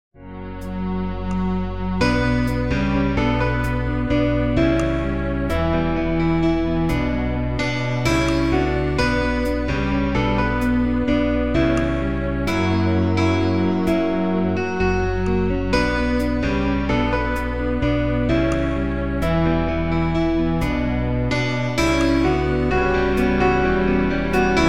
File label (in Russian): zvon